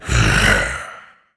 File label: zombie aware; DeadFright